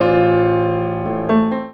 PIA, PIANOS; USB Soundscan vol.02; AKAI

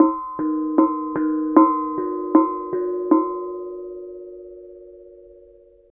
KENONG; Spectrasonics; Heart of Asia; KENONG PH

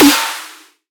Snare

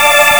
string minor, synths

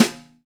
New Jazz Snare; Snares

